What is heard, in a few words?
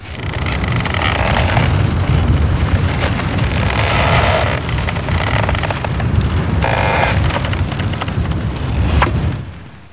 noise